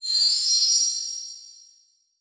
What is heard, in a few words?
Beep High Pitch; Adobe; Multimedia Internet CD-Rom Flash